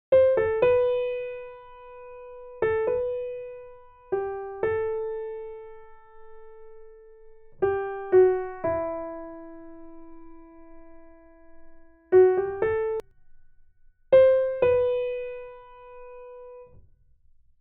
keys midi seq, Piano

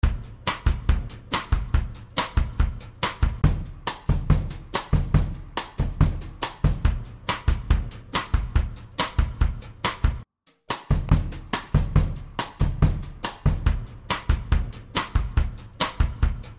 beat

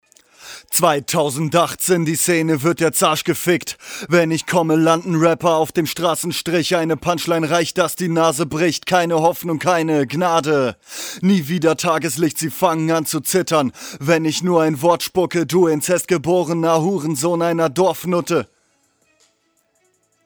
accapella, vocals

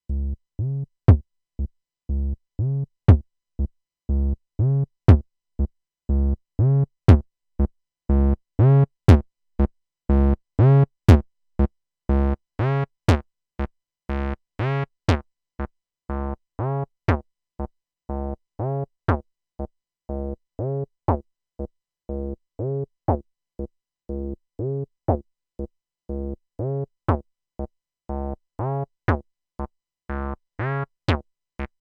cyclone bass bott saw 16 bar, Techno; 303 Programmierung

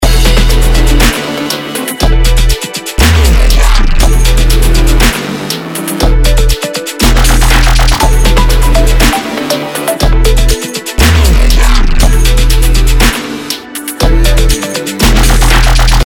chillstep

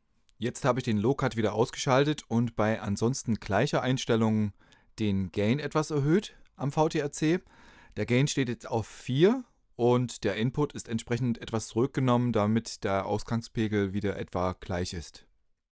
apollo m930ts

